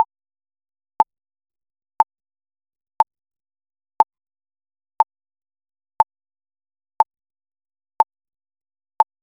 a cute and bright pang

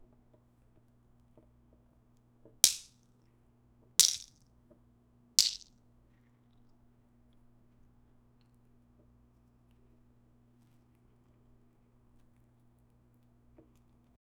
someone scaling a fish; food preparation

